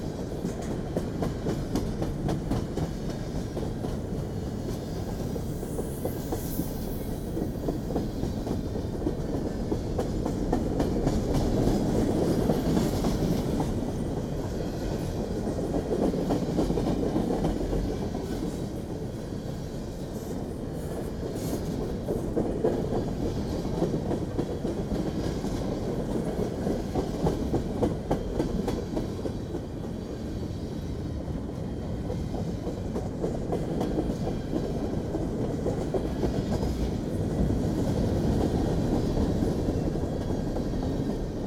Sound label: TRAIN; SIMUZENSOUND